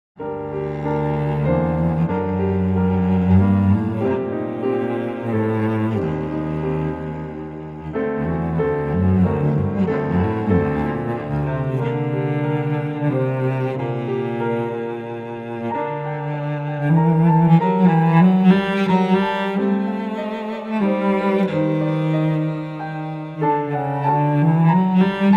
cello; virharmonic

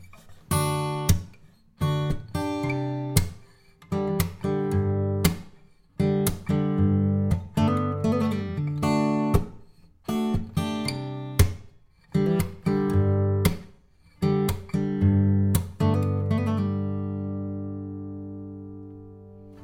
GUITAR; warmaudio

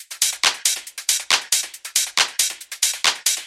Drum Loop Without Noise Gate

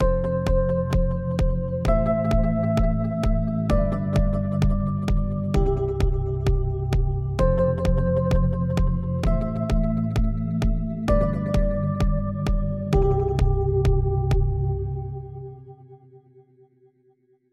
Chords

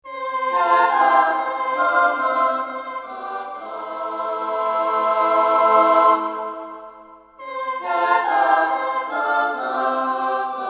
Female Choir Tape; dexibell

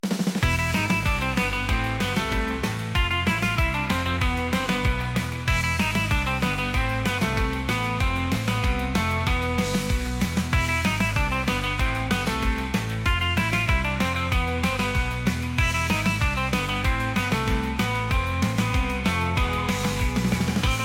rock, surf rock with organ and claps; Suno

